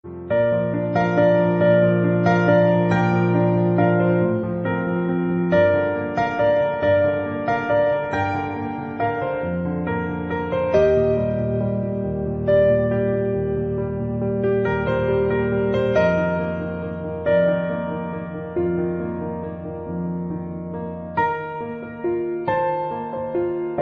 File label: piano